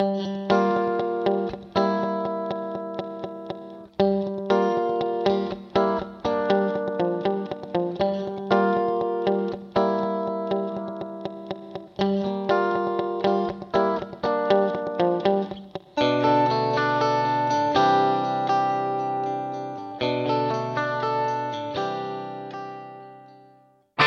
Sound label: Guitar Yamaha Rev7 ER2 Mode 3 Vintage Digital